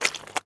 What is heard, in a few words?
footsteps double squish mud